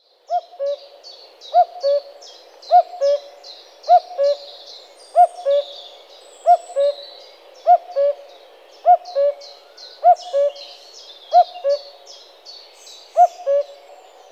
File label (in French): Coucou gris